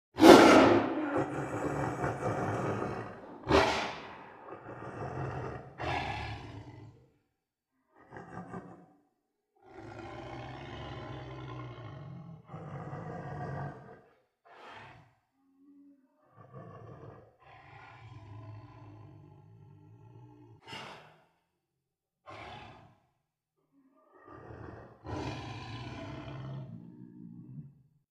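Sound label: Tiger Growl Deep Menacing; big cats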